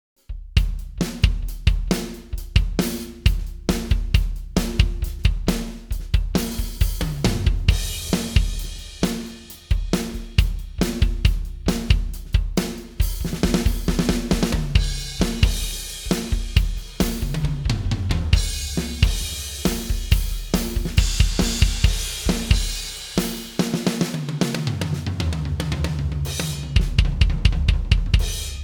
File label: drums